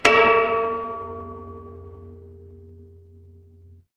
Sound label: instrument gong